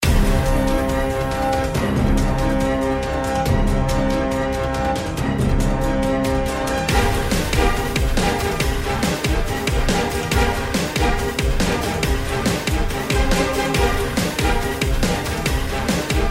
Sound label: bgm; slots; sRoma